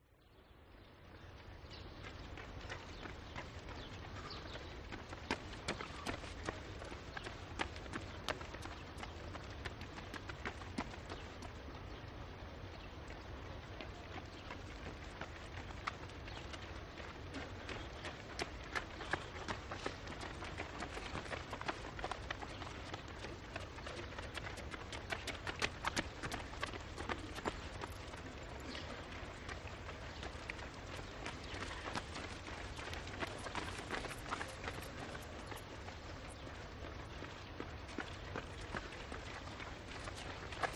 sport runners competing race marathon few pass by city drone background